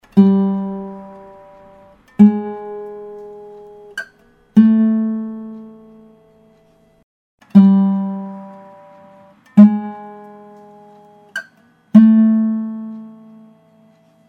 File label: yamaha after, guitar vibration